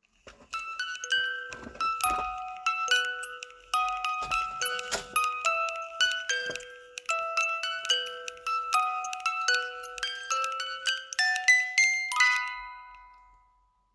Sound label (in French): Chanson, manivelle